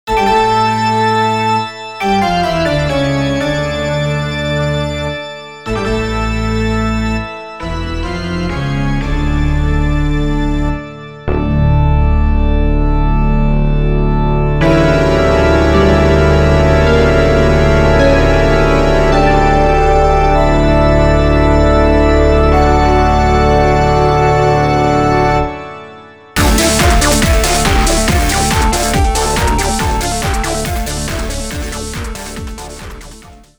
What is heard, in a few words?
toccata and fugue in d minor; Bach’s Toccata and Fugue in D minor BWV 565